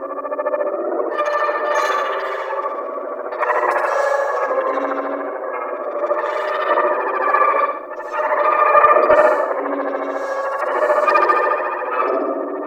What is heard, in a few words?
Anima Calm Loop A; Warframe; Warframes; Anima Animus